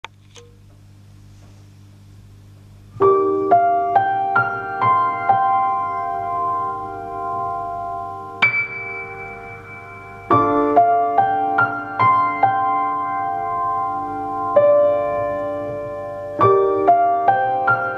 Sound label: Piano